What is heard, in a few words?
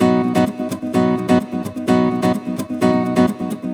Nylon Guitar - B maj, 128BPM; Vengeance Samples; Special Sounds; Nylon Guitar Kit